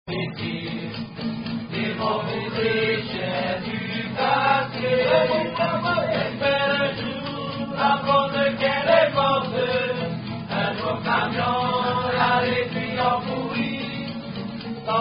Unknown Song

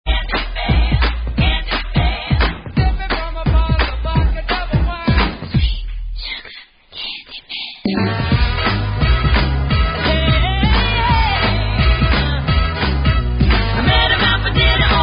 Sound Off (The Duckworth Chant)

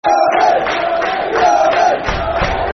Green Army, Green Army, Football Chant; Yeovil Town Ytfc; fanchants

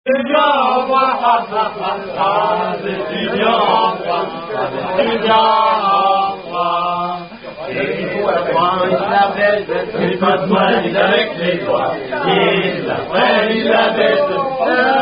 Unknown French Rugby Song

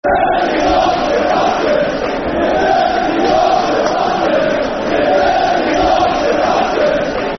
Dirty Yorkshire Bastards Football Chant; Bury; Bury Bfc

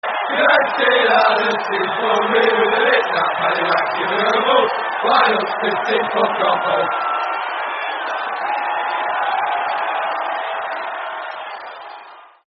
With Crowd, terrace chants; Man United

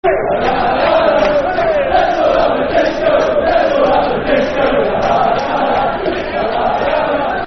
Let's All Have Disco, Football Chant; Yeovil Town Ytfc; fanchants

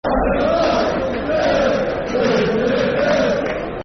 Football Chants; Sheffield Wednesday Swfc

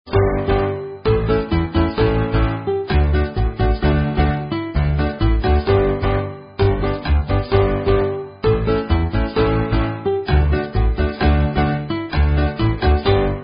Karaoke Songs; Girl Scouts

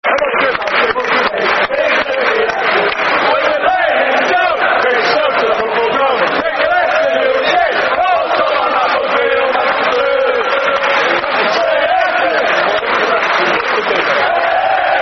Football Chant (Soccer Song), Man United; United Calypso

